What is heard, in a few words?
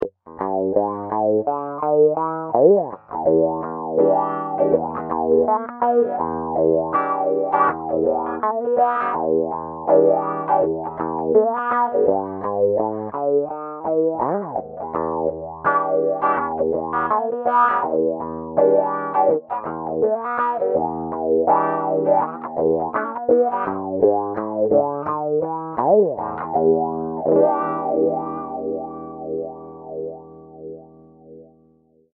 Wah